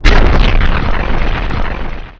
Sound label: nuke; SuperPower 2 Redux